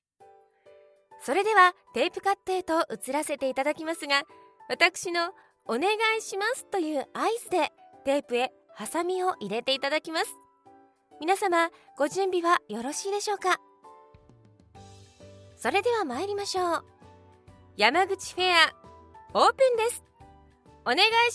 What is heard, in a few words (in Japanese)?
テープカット（明るい・BGMあり）